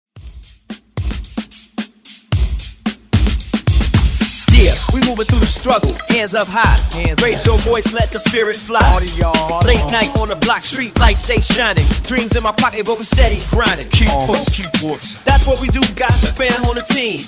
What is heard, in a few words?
90s Re Drum Remix 111 Bpm; DJDOLLS